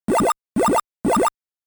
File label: 8bit; donki 2men